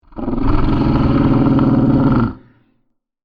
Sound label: Monster Yeti Growl, Creature; Horror; Monsters; BlastWave